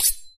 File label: button Click; wild wild west